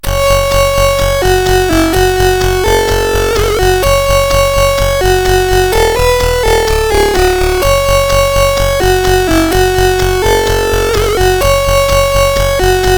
Russian, sid

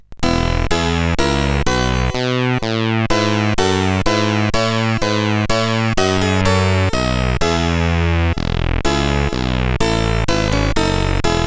Bach CSG8580R5

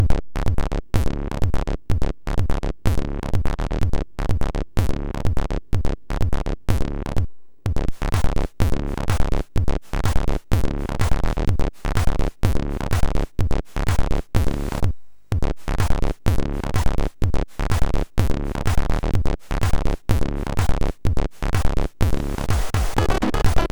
sid MOS6581R3

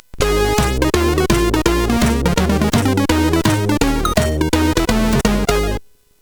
Game Over, CSG8580; hvsc

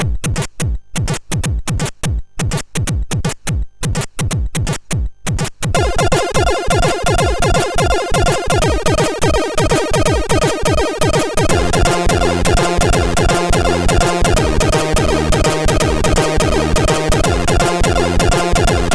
SID Jig, MOS6581R3

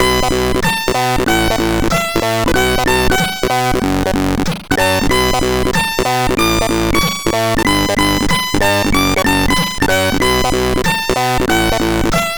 Visages Editor, CSG8580R5; JCH in Visages Editor

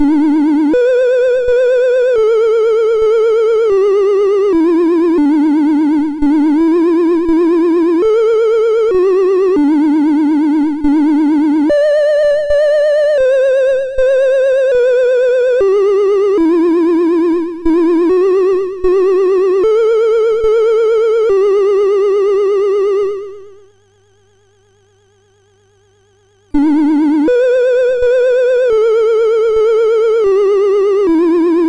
tune sid MOS6581R4

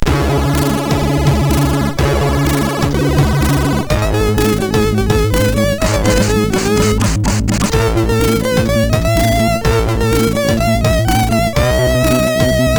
Hawkeye loader, MOS6581R4; sid

